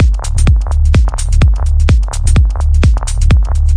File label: formidable techno, drum loops and breaks